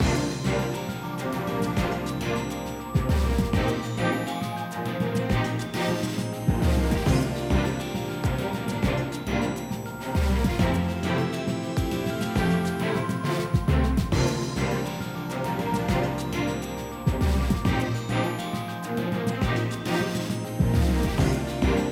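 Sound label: BGM Battle Result; the king of fighters for girls; android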